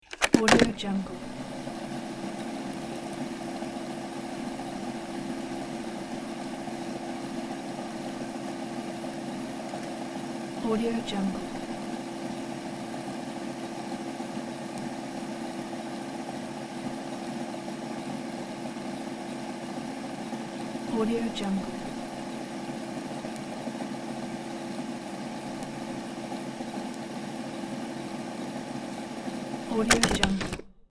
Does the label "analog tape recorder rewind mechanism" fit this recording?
Yes